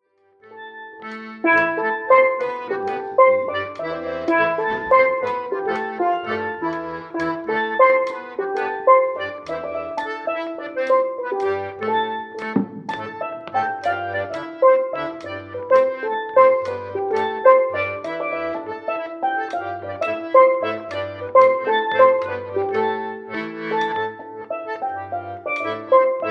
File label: polska